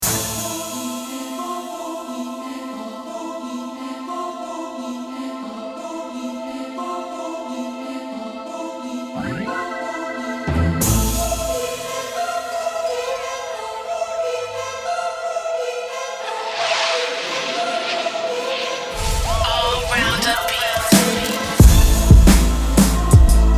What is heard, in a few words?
Instrumental 89bpm; Allrounda Productions